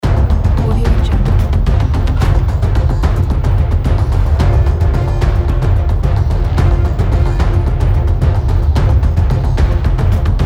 dark tension loop

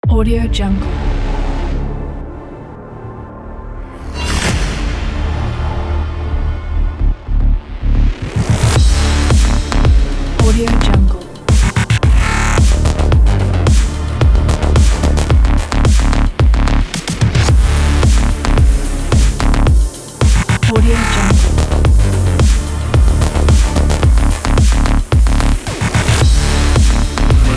cyberpunk action

